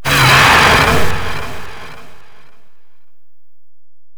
Golem Growl; Enemies; Warframe

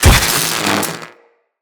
Electro Prod Hit Flesh; Weapons

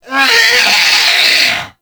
Mariner Zombie Attack, Vocals; Grineer Mariner; Warframe

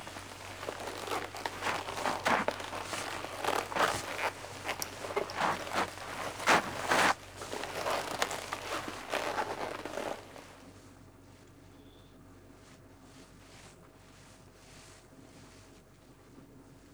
Blade Against Throat; Warframe; Duviri; Cinematics; Teshin

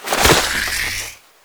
Cover Cast; Warframe; The New War; Kahl; Abilities

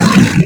Flying Mouth Pain; Warframe; ManInTheWall

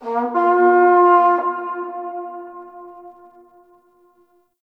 Battle Trumpet Horn Call; Warframe; Tau

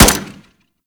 Fire Mech, Weapons; Warframe; Burston

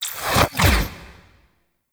Shot Cast; Warframe; Noctua; Weapons

